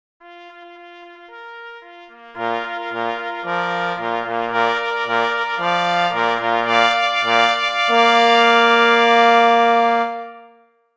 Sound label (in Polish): Fanfara; St. Rajba; Fanfara IV; powitalne fanfary stanislawa rajby